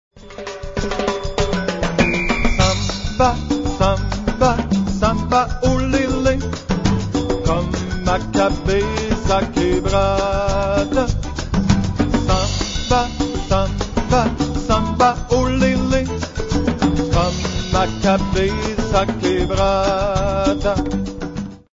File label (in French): samba bresilienne